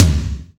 high tom sound c key, Royality free tome drum sample tuned to the C note. Loudest frequency: 1271Hz